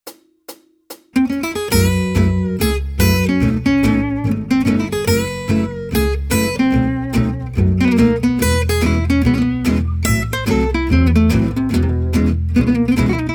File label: Jazz, Guitar